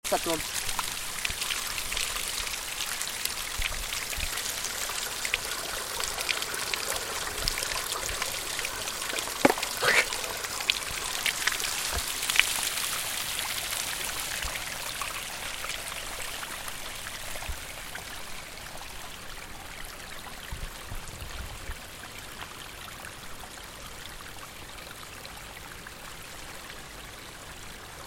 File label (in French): AMB RIVIERE